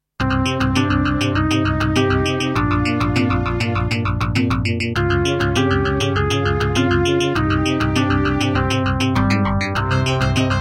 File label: Doepfer GMX-1; Hocus Pocus